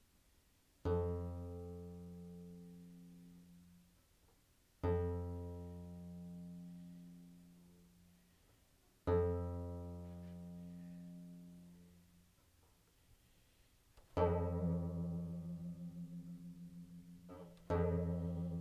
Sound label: clang